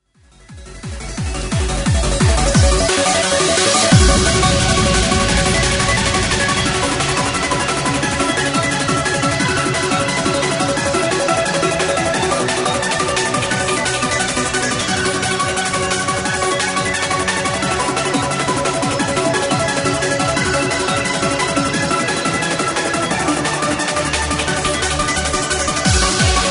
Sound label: hold the beat; cube hard; Electronica Exposed